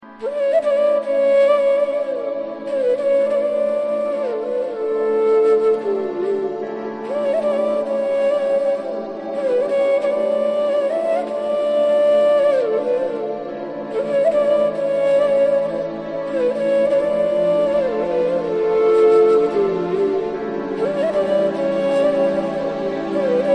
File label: Flute Ringtone